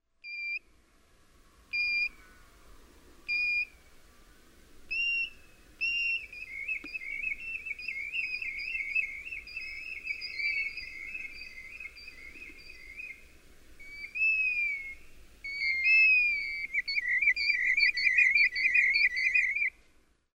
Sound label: Golden Plover